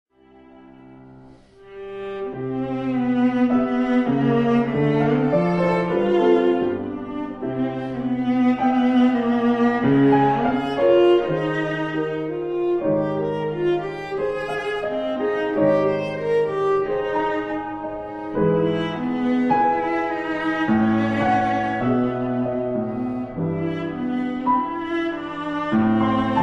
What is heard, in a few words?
Phantasiestucke For Viola Piano Ruhig und ausdrucksvoll; Fuchs; Op 117